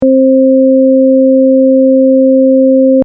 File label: 264+528 mono sin